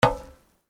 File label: Footsteps Iron plate One